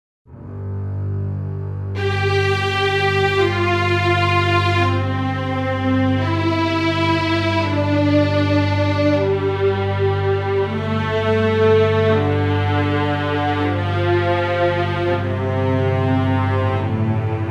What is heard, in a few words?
String Ensemble; Native Instruments Symphony Series String Ensemble